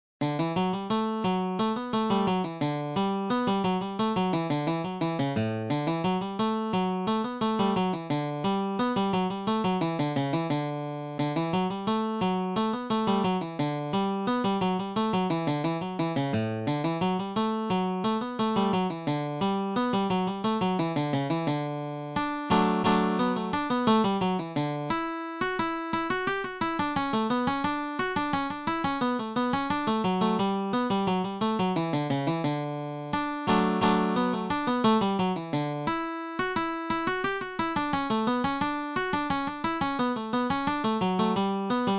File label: whiskey before breakfast guitar